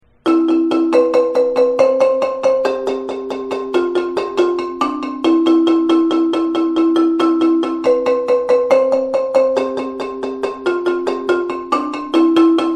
marimba, intro and chorus

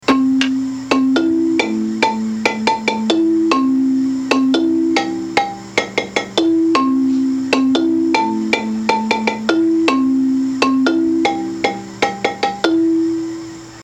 bari solo, marimba; manhanga

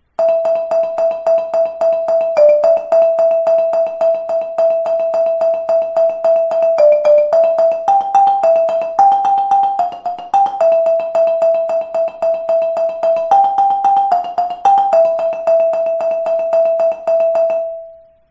chorus, marimba

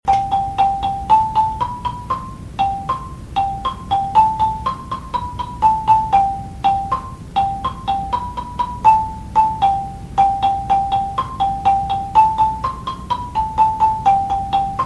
lead high, marimba

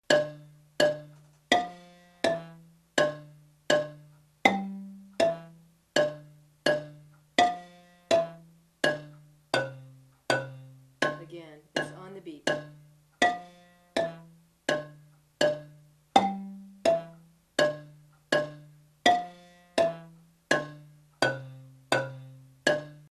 left hand, marimba; kare mugomba

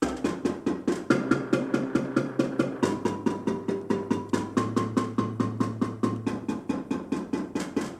bass intro, marimba

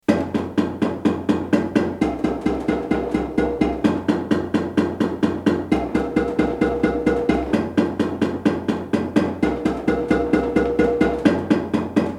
bass, marimba; gazelle

